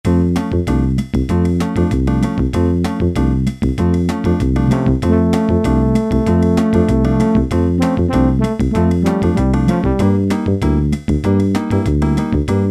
SAMBA, Yamaha SHS-10 Factory style demos

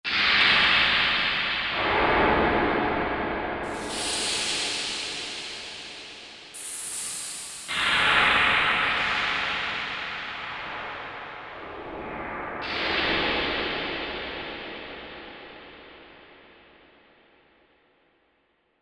Hall Bang; Korg MSC-14S Sound Effects

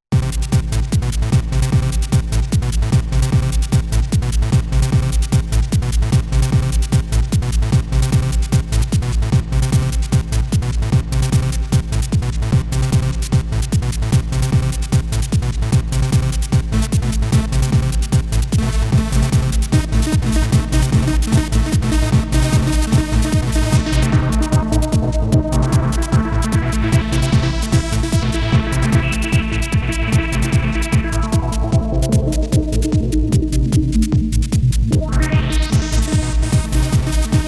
Hardstyle

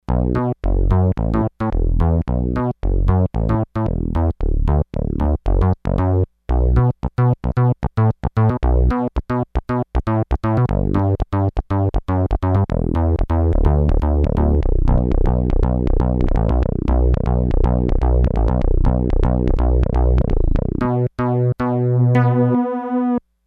Fat Synth Bass, Roland D-10; Factory Patches

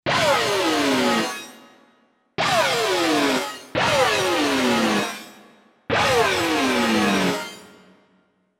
SCRAPE SHIFT, Roland SC-880; Factory Patches

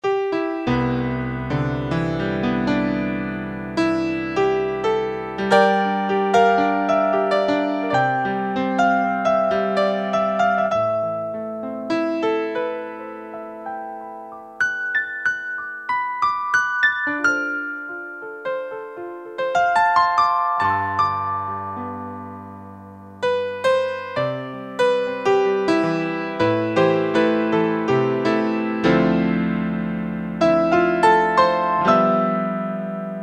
Piano 3, Roland SC-50 Sound Canvas; Factory sounds

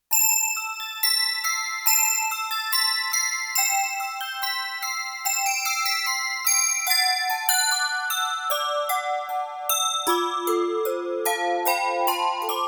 Bells; Roland JD-800; Factory Patches

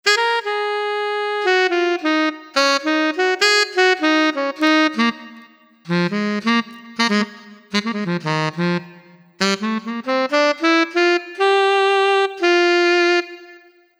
Tenor Solo

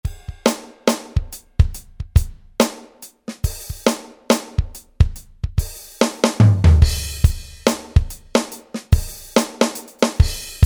Phunque KIT, Roland SRX-01 Dynamic Drum Kits

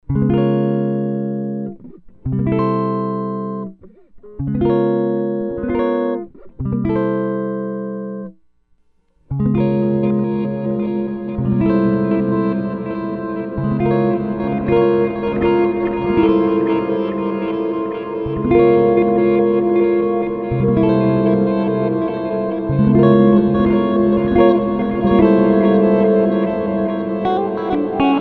Earthquaker Devices Avalanche Run

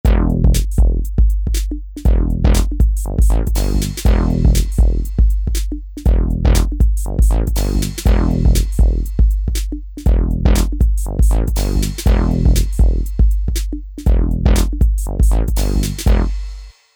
Rap Hip Hop

